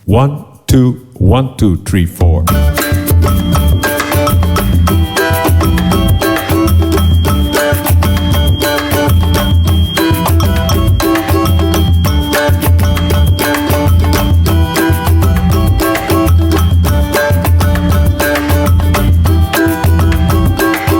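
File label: partido alto rhythm basic, guitar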